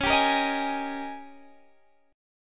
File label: G sharp Major 7th Suspended 2nd Suspended 4th C sharp